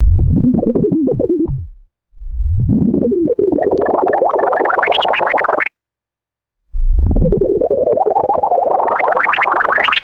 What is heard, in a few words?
retro anime bubbly rising sound effects; cartoon